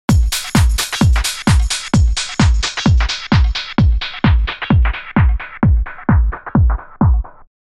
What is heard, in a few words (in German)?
Loop mit Sony TP